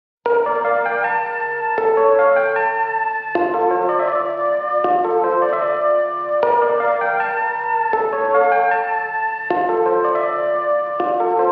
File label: instrumental sped up